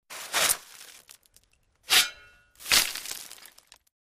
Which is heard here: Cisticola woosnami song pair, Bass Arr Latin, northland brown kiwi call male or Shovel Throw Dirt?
Shovel Throw Dirt